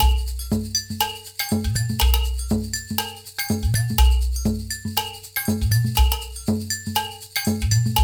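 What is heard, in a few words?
120 bpm, industrial udu; Spectrasonics - Supreme Beats - World Dance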